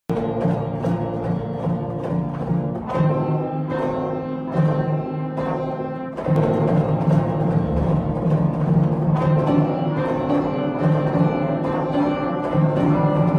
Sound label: Band Practice